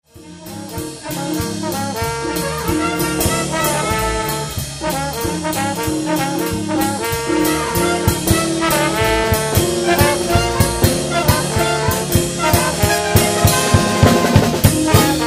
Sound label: BigBand